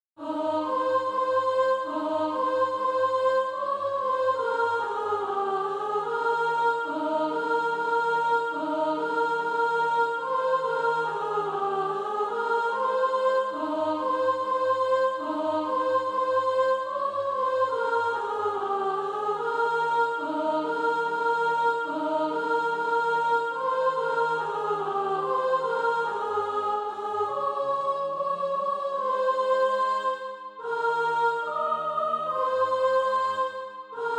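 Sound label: sop, Practise Music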